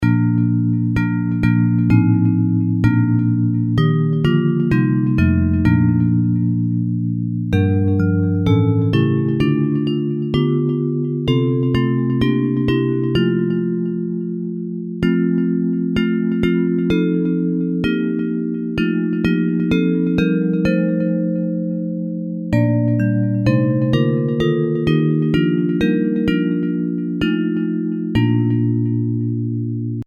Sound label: Bells